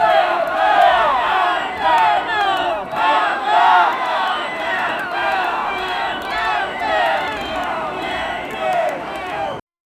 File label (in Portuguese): multido de protesto grita, crowd